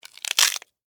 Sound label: Insect Eat Chew, Bite, Crunch, Bug; Animal; Insect Eating; BlastWave